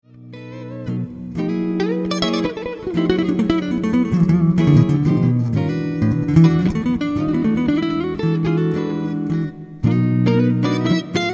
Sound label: slow blues lead and rhythm sample